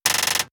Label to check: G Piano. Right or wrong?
Wrong